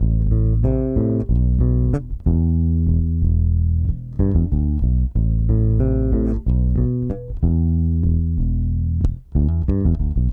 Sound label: bass, analog funk